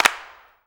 CLAPS, SINGLE CLAPS; Best Service; World Colours

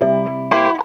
CLAV GEL; USB Soundscan vol.32; Roots Disco; AKAI

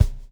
SLICK, Backbeat

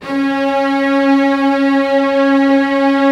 STR SEC, STRINGS; Roland - LA Composer vol. 1

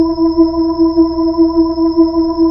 B-3 Chorus

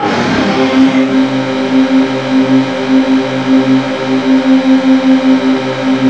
ELECTRIC

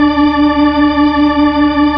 B-3

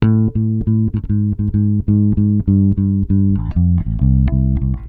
SMOOTH 98 D, Bass Grooves; USB Soundscan vol.30; AKAI

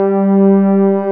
SYNTH PAD G3; E-MU Formula 4000 Series Vol. 5; Protozoa; Proteus1 Presets

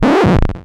EFX, SFX KIT; Wizoo - Platinum 24 Electronic

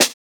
Snare, JUNGLE BREAKS

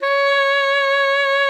C#5, alto sax; Xsample VOL-2

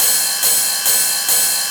Ride 140-BPM, Ride Loops; Zero-G Club Techno; Drum Loops

